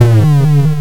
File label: demon jump; mazeofgalious